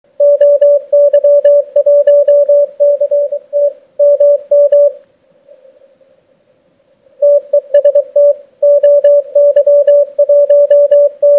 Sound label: CW DEKODER RX